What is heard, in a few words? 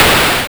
explosion